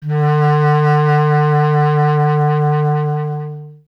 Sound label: B. Clarinet